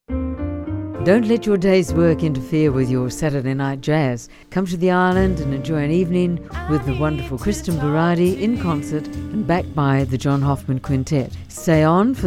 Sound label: PROMO ISLAND JAZZ